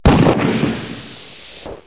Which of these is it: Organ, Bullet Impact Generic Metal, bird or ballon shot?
ballon shot